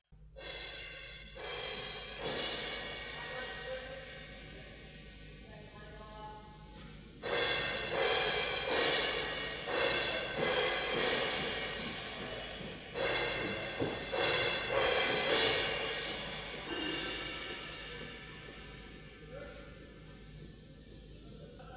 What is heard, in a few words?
Drum Check, Live